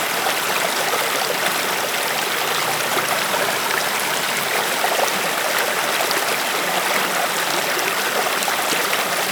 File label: Waterfall Loop; moul